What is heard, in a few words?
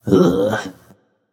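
nonverbal ugh; smite; voicepack; merlin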